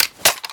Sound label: hybrid toggle on, foley; gmod; viper